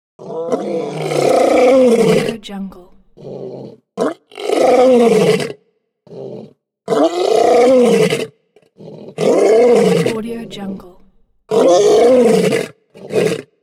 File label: lions roaring; pure-noise